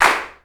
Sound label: clap, funk kits; Crypton - DrumBase5000